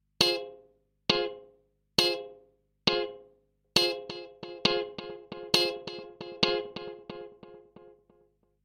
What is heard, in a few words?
generating rhythms, delay echo